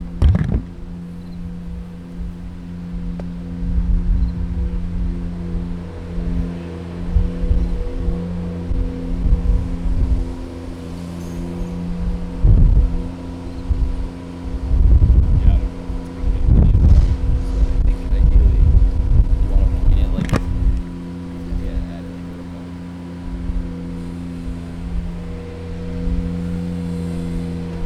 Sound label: Cricket